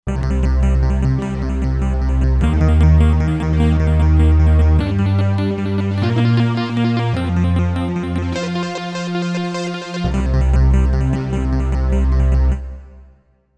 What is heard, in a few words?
Fx Rhythm Wheels, synth